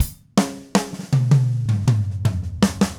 Green Maple Groove 80, Vital Drums, DW Green Maple Kit, 80 BPM; Sonic Foundry (Sony Creative Software)